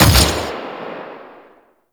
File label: Fire Shot; Warframe; Weapons; Duviri; Incarnon Adapters; Incarnon Sybaris Prime